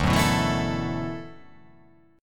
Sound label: D 6th Add 9th D